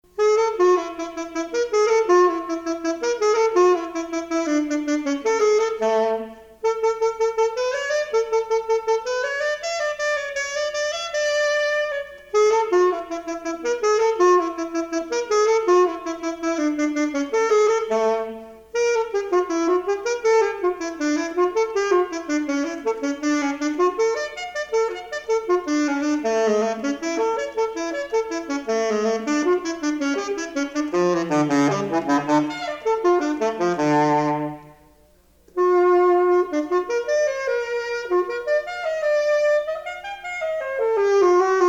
sax

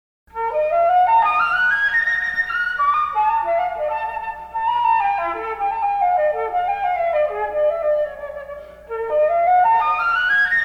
flute

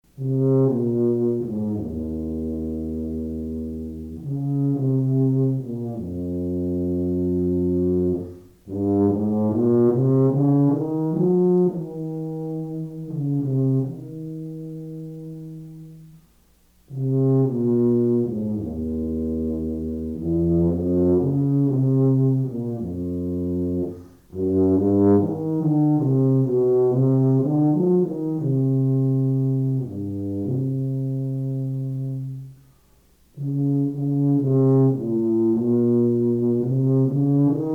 tuba

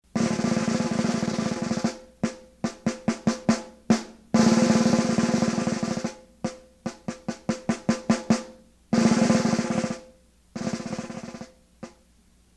snare

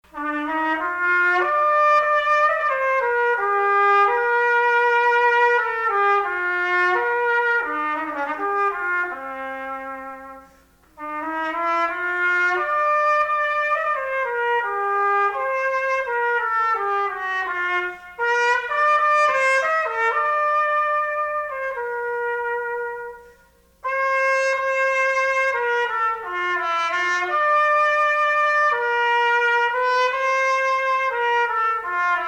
trumpet

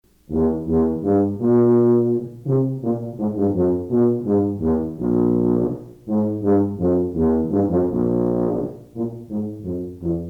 tuba

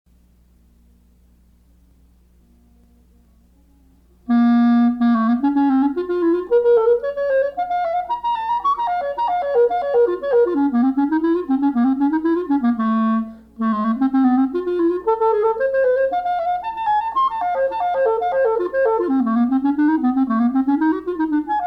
clarinet

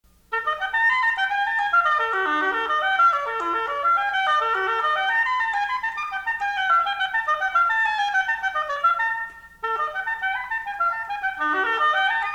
oboe

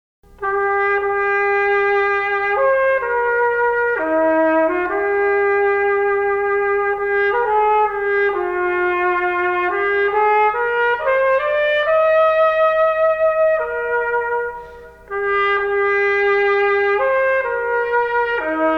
trumpet

